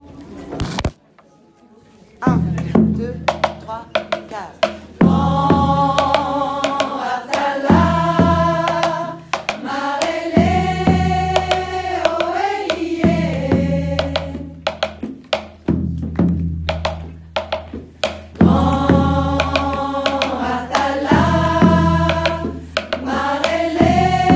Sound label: alti, singers; Outdoor-Events; Deblozay